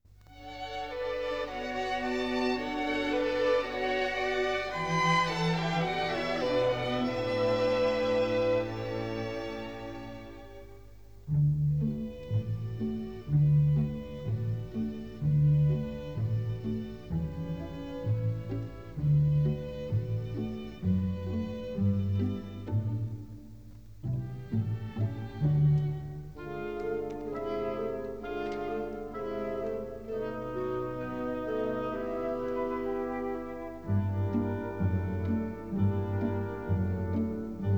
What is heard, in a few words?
SOPRANO track only